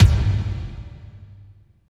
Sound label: KICK, Tekno Industrial; East West